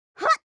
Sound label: FEMALE attack